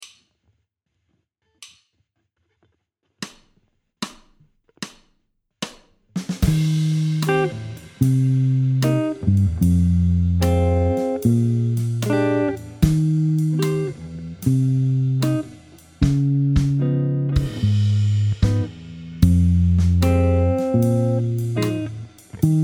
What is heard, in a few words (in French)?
moonlight comping, guitare jazz